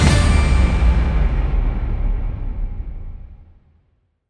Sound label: jackpot end